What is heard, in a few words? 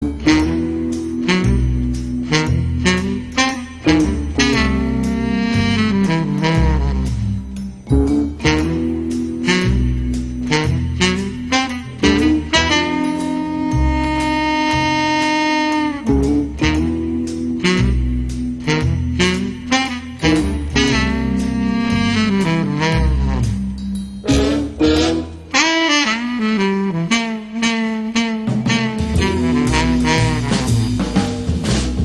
theme song